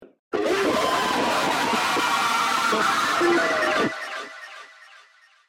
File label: Horrorboros scream